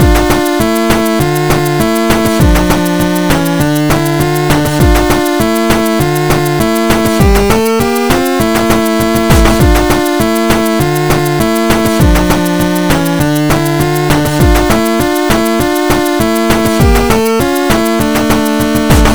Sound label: song